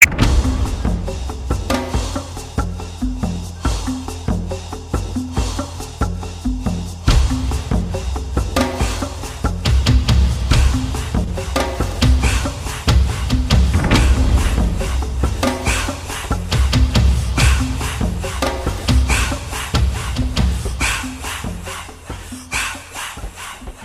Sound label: Drum Effects